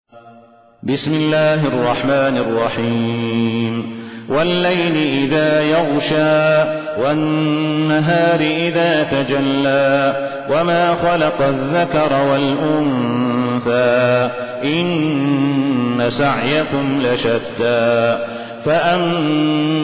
ترتیل; صالح الصاهود; سوره لیل

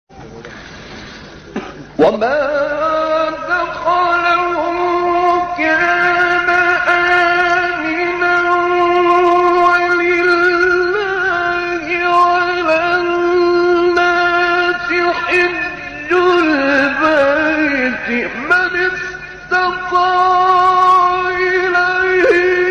تلاوت آیه سوره; آل عمران

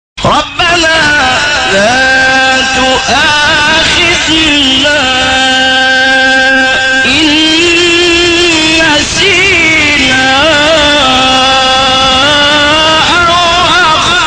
تلاوت زیبای آیه سوره بقره; 286; محمد اللیثی

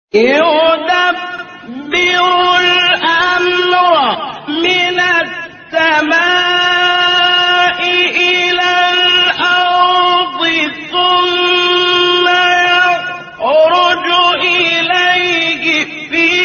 تلاوت زیبای آیات تا سوره سجده; 5; محمد اللیثی

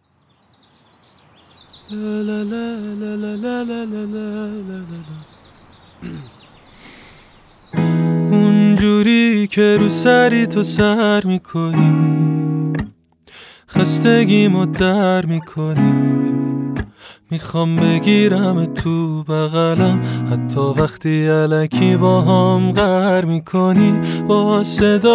نواهنگ

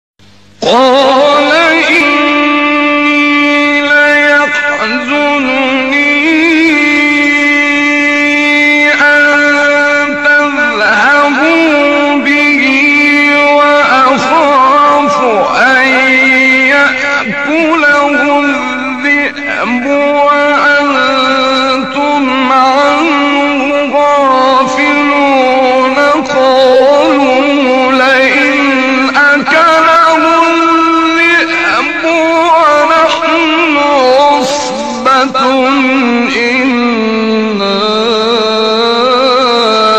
تلاوت آیه سوره یوسف; آیه 13 14

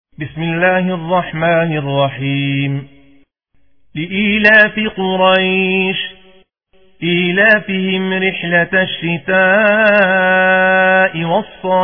ترتیل قرآن کریم روایت حفص عن عاصم; سوره قریش

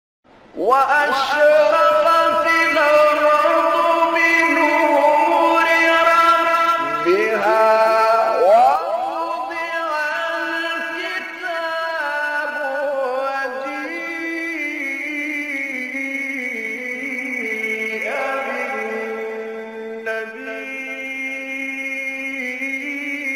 تلاوت آیه سوره زمر; آیه 69; استاد طبلاوی

